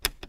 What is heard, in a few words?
Button Click